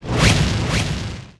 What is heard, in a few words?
rage blow